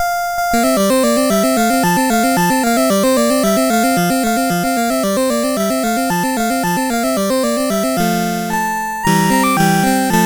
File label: Gavotte, CSG8580; Les Petits Riens